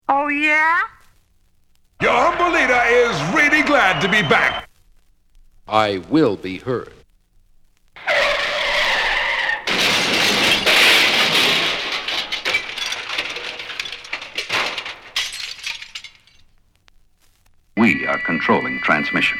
samples scratches

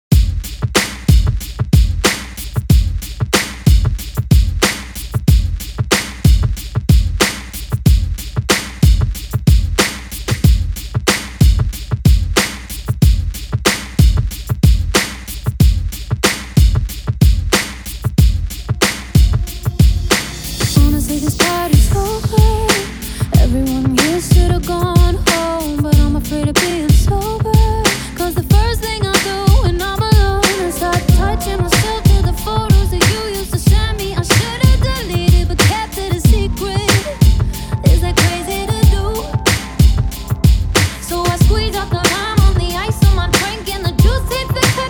bpm 93

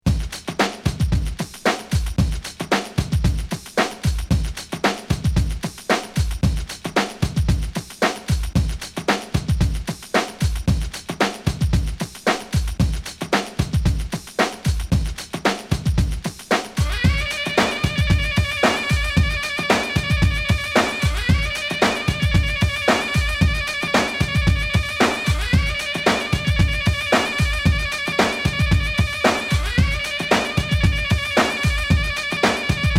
beat

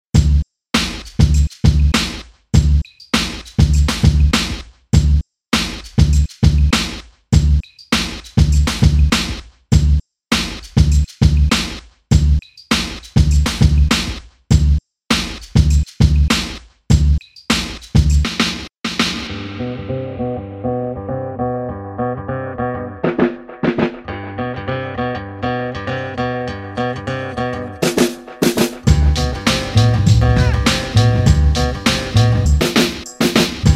break bpm 100

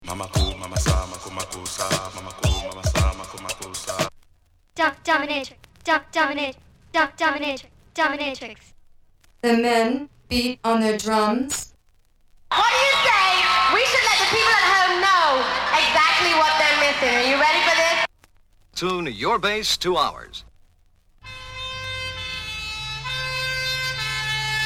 samples scratches

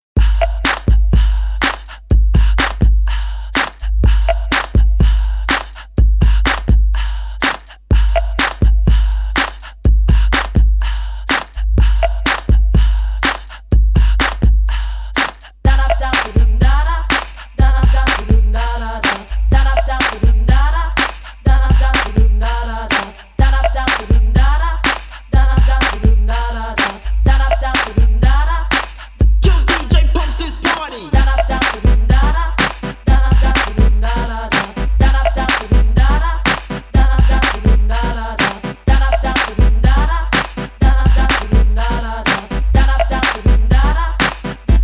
break bpm 124

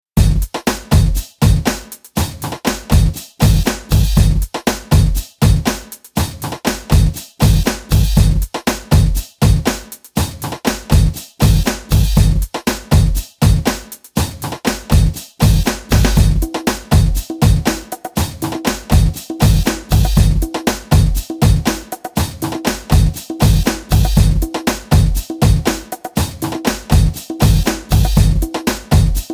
loop track

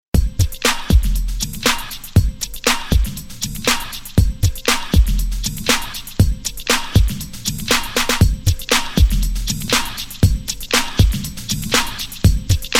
loop bpm 119

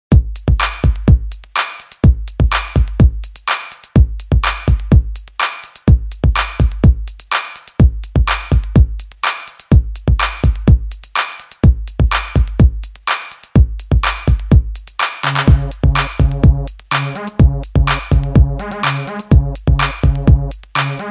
loops beats; full tilt remix vol 011

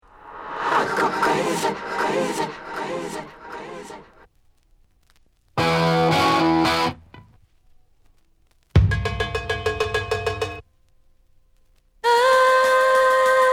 samples scratches

